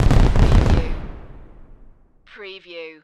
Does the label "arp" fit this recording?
No